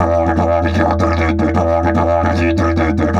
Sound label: 150, DIDGE RIFFS